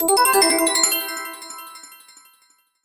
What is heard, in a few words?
htc one ocean, ringtones